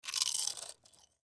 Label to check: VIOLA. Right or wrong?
Wrong